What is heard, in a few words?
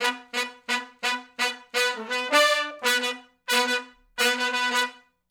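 Funk Riff (B) unison, Horncraft for R&B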